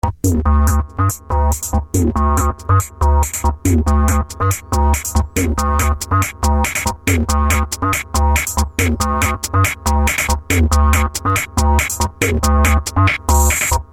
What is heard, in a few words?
techno; killing techno move49